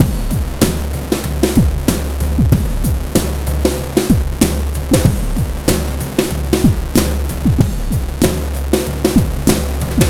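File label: drum, Breakbeat; Best Service ProSamples vol.24; AKAI; DOOM BOOM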